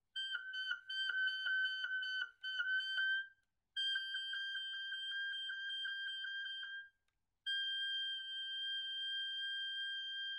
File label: trills